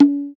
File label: Hager, Percussion; Roland BK-5